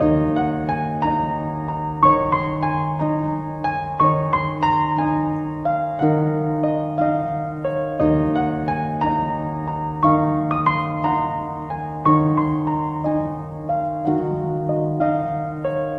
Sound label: piano longful, Loops Sad